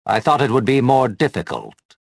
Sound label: victory; reborn